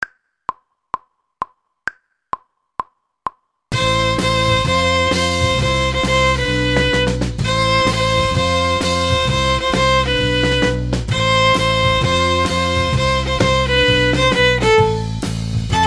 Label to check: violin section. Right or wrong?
Right